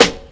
clean steel snare drum sound A#

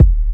natural bass drum sample E key; kick drum samples

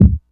natural kickdrum sound d key; Mono

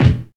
punchy kickdrum sound b key

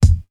original bass drum single hit E key

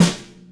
deep snare single hit f key; snare-drum-samples

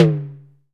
low mid tom drum one shot d sharp key

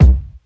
warm sounding kick drum sample c key

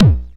dry bass drum single shot a sharp key

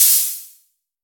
long room reverb open high hat sample D# key

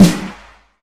long room reverb snare drum sound f key

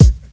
short kick one shot a sharp key; kick drum samples

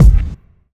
reverb bass drum single shot F sharp key

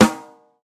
round snare drum sound A key; snare-drum-samples

